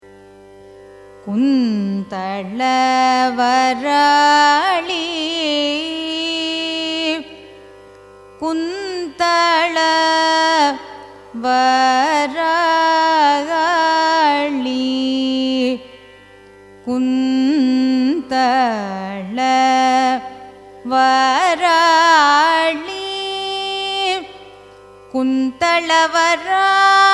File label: raga kuntalavarali signature, carnatic music